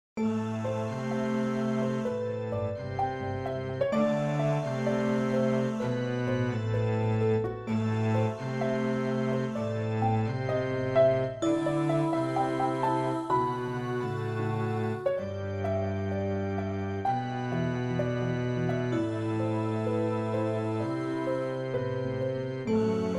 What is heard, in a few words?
sword princess; クラシック; 暗い; BGM